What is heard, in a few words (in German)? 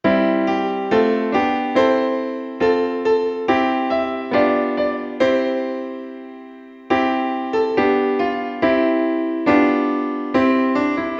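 Alle Jahre wieder piano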